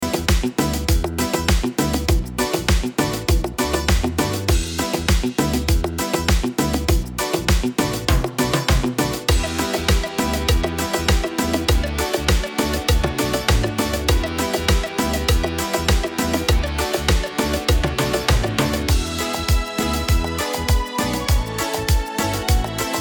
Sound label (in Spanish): cumbia